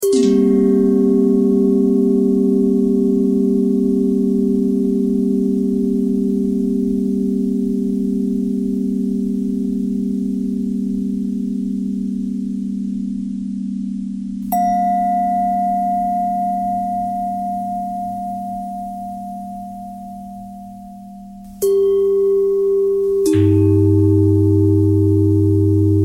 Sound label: bell thread